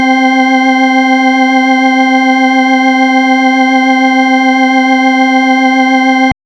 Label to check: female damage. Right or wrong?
Wrong